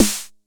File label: Snare 909; gate experiments